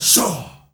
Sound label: SHAWSHAAB R, GRP CHANT, Heart of Africa; Spectrasonics; Heart of Africa 1